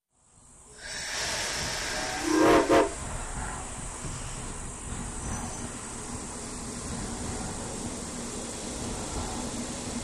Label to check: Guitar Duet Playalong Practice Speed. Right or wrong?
Wrong